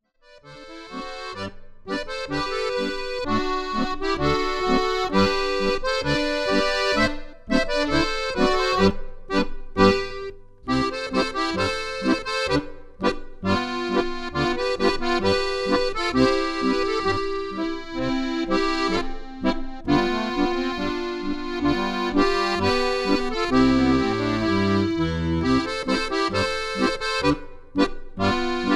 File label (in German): Boarischer